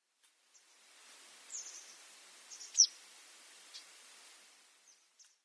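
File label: Citrine Wagtail; kigashiraseki; sakata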